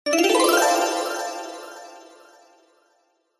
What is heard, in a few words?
fantasy magic wand spell cast appear layered rich; zapsplat